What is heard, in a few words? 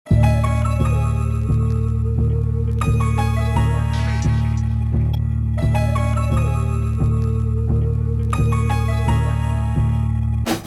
Instrumental; Prod MeHighLove